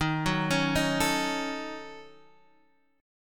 F sharp Minor 6th, D sharp, guitar chord; arpeggiated

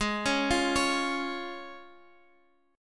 Db Major Ab

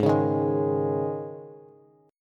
Ab Suspended 4th, Bass; Absus4 strummed; JGuitar

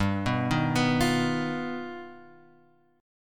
G Augmented 7th, chord; G+7 Chord {3 2 1 4 X 1} arpeggiated

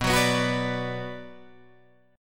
B Minor B Guitar Open G, chord

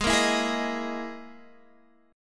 E Major 7th Flat 5th chord

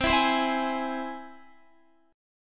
C Augmented, Guitar, chord; strummed

